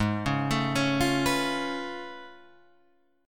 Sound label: A Augmented Major 9th G sharp